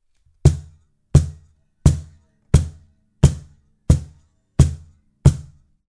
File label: cajon bass